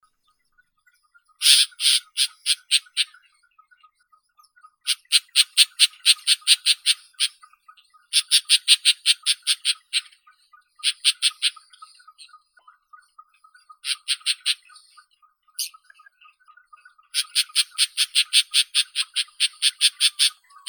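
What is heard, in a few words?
isabelline shrike, Lanius isabellinus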